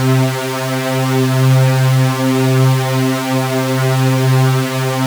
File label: supersaw